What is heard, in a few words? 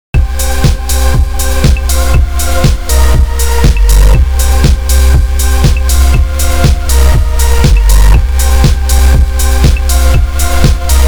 intense bass